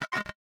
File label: Core Console Remove Char; Game rip; MiSide